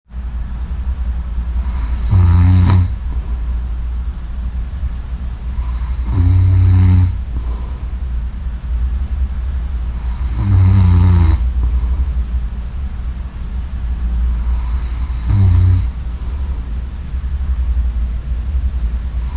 snoring while sleeping

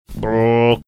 brrrrrrrh

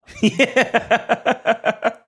chuckling

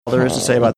pained sound

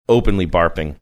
openly barping

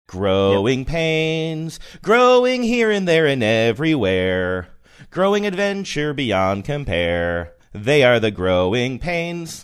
singing; growing pains

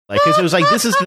funny laugh

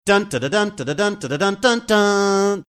singing portion football anthem; fox's

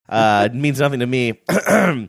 fake cough

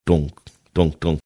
laying down kick drum beats

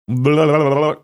making sound shaking off being stunned or dazed

